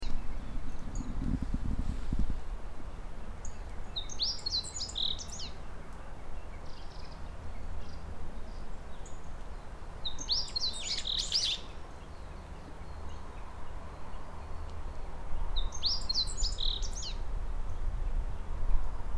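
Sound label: houjiro, meadow bunting; ホウジロ; 標高750ｍ〜850ｍぐらいの田園地帯（里山）; ホウジロの声です。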